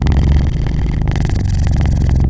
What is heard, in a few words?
Purr Loop, cat; Talking Tom Cat 2; android ios